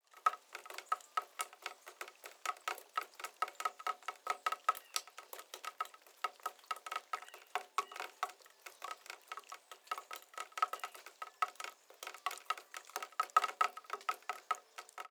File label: Water Drip Downspout Drips